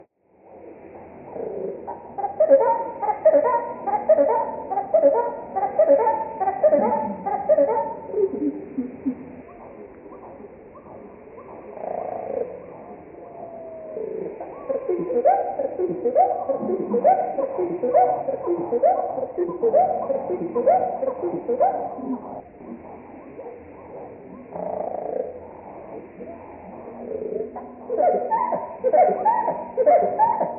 Nebraska Eastern male at Pony Lake 4 songs 1 slowed 8x, Marsh Wrens; Donald Kroodsma